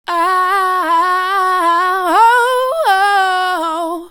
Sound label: 120 ahoh Cm, dance vocals; Sample Magic White Label Dance Vocals